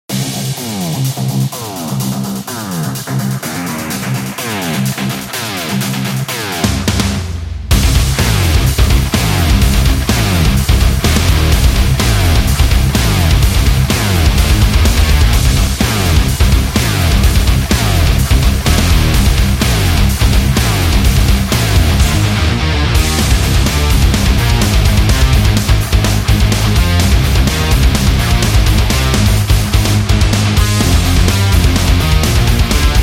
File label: metal; Vehicle